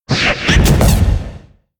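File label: meerkat attack, monster; Senillinea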